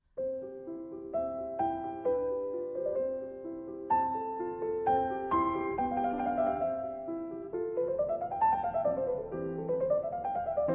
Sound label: Piano Sonata No. 16 in C Major I. Allegro